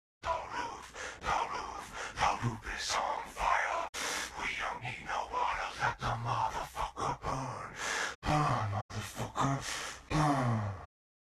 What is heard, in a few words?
Intro Vocals Synth; Desert Storm WMD Sweeper